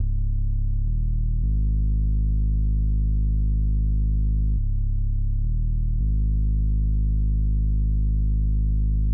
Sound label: G#maj 105BPM Bass; 99Sounds Music Loops; PANDORA BOX!; Yacht Week; Monosounds